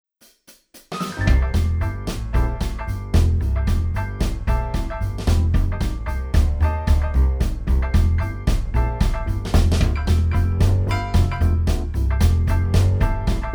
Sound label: cutting room donut rod; tascam